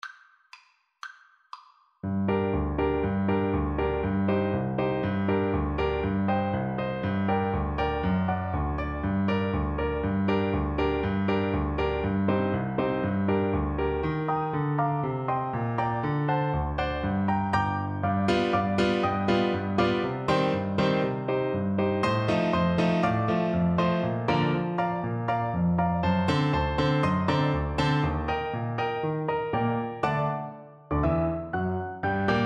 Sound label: brahms hungarian dance 5, Saxophone